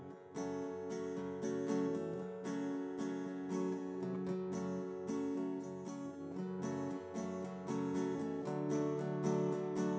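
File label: with drums, instantaneous mixture; SiSEC11; underdetermined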